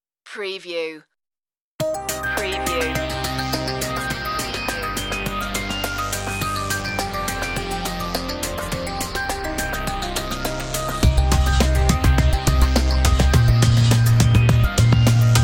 ambient breaks

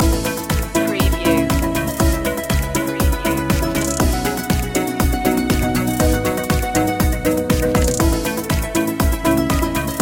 musical notes loop; media music now

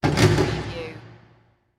Basketball Rim Hit; sound effects bible

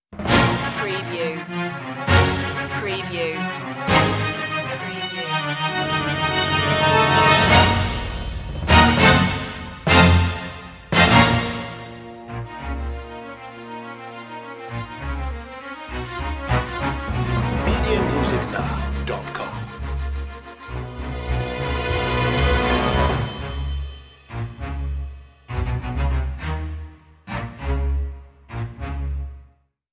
no choir

